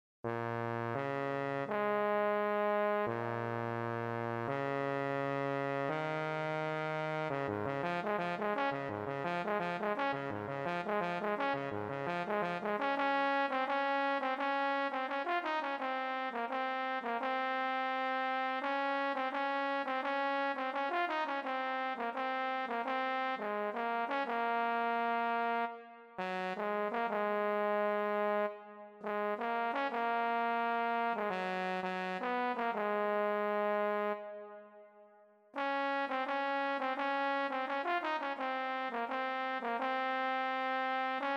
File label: euphonium